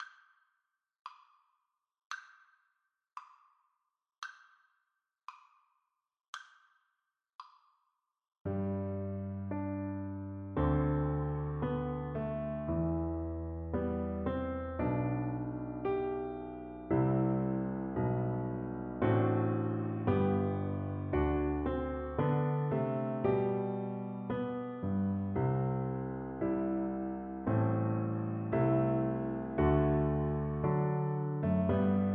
French Horn

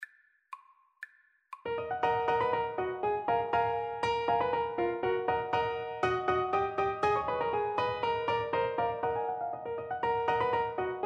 Piano Duet

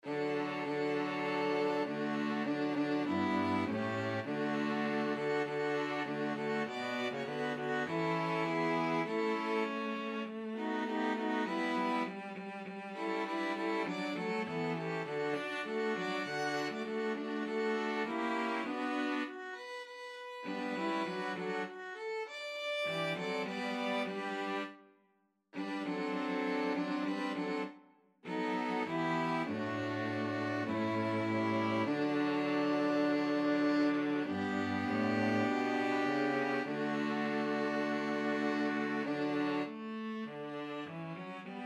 string quartet

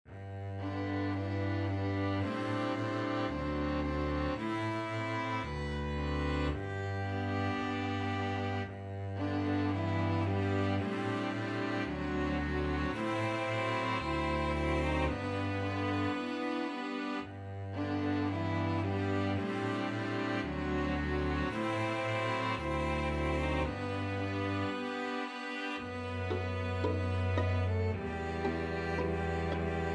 love you, string ensemble

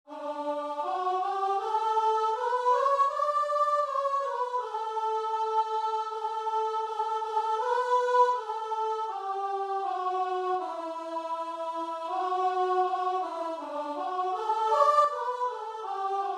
duke street, guitar and vocal

